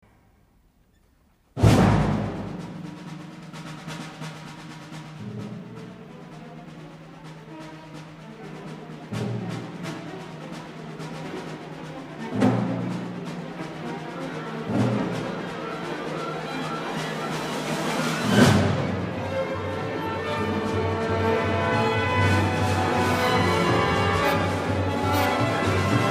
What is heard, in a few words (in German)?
orchesterstueck